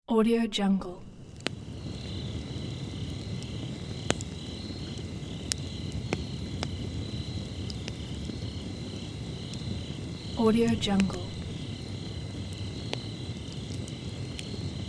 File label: night campfire